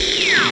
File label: STATIC; East West - Phat & Phunky